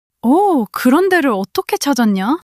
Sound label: surprise